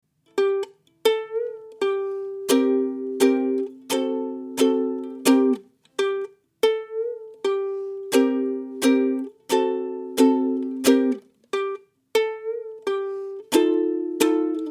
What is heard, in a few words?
Heavy Blues, ukulele